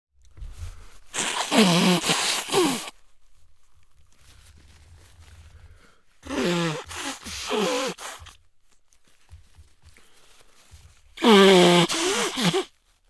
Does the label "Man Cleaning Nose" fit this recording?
Yes